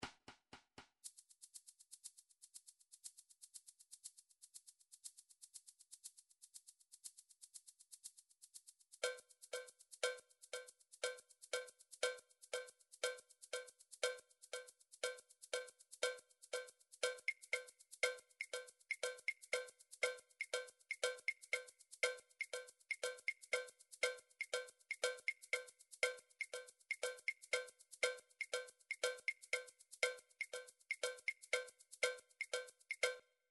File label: drum trax per